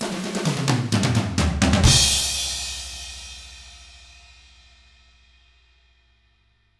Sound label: HARD ROCK, Drums